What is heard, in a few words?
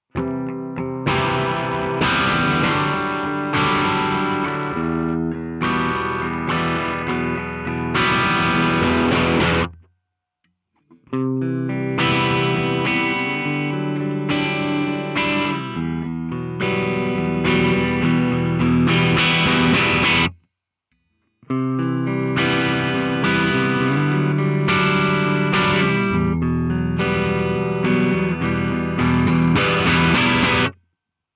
crunch